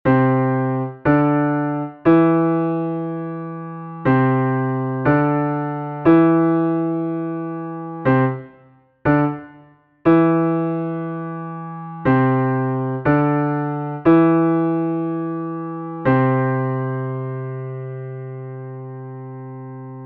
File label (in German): lied artikulation